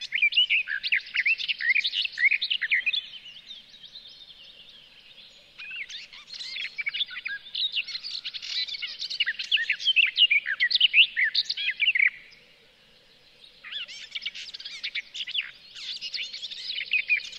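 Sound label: garden warbler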